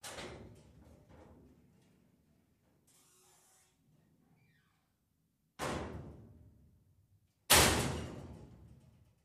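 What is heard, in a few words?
Locker open close W Slam, Medium Distant, Interior Pov W Squeak.; lockers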